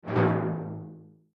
goal collected

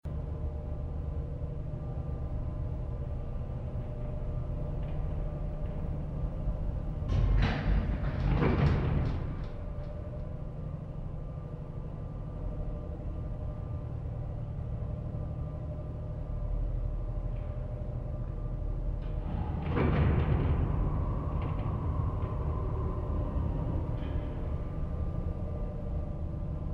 ambience hideout

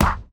battle punch

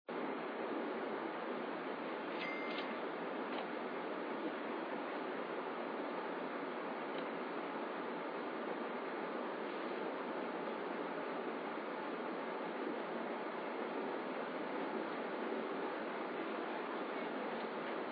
ambience shop

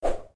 battle attack

